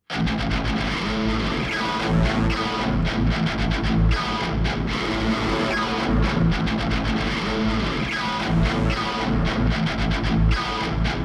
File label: Boss Fight; prince of persia the lost crown gamerip 2024